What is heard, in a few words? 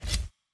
missions metal out